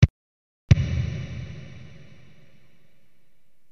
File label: nano BD